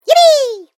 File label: Created Creature Small Yippie, Alien Sound Effects